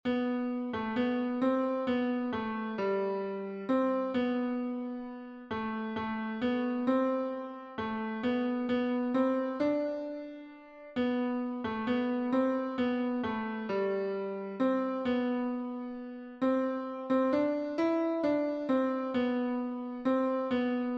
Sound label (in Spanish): TENOR Piano, himnos en piano; cantos del camino